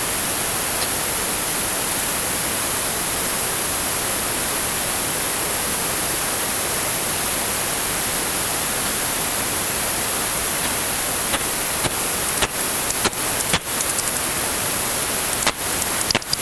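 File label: front window Batbox Duet 20kHz heterodyne, bat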